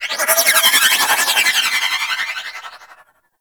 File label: Short Bow Projectile; Warframe; Duviri; Dax; Weapons